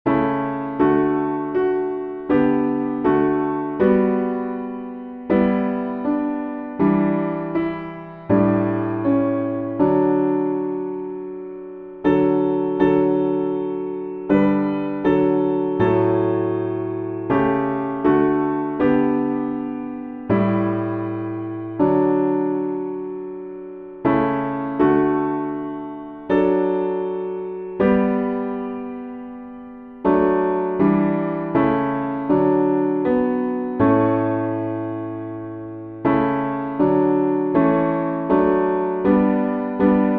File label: piano backing, hymns; The Church's One Foundation